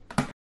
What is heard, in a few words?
button push